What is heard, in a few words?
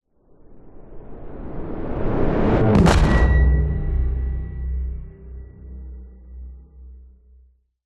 sound design woosh dark science fiction droning impact; zapsplat